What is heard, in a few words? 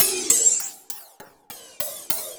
Investigator Ride; Sonic Foundry (Sony Creative Software); Loop Noir; Session 08 - Psychic Investigator